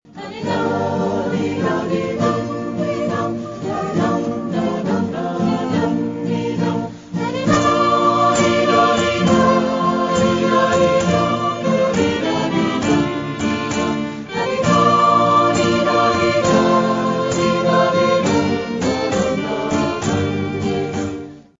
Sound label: gaillarde